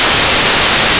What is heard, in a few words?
static